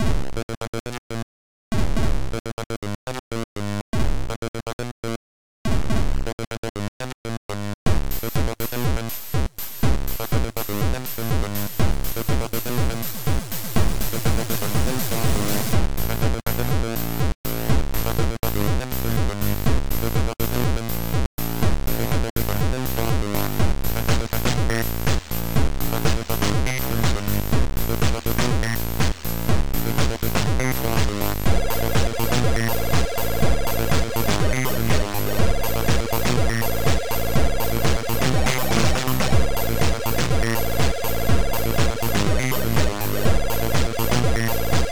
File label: Great Noise